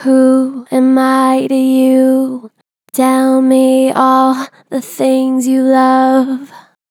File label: Natural Vibrato Gentle, Harmor Vocals; Who am I to you